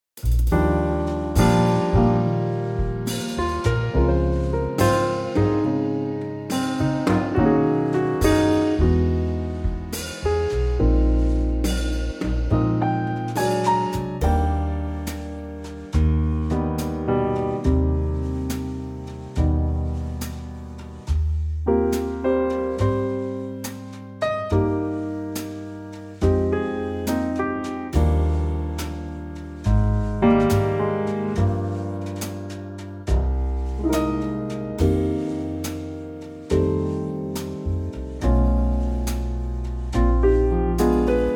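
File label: E flat trio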